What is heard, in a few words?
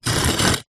brick scrape over light dirt on concrete; foley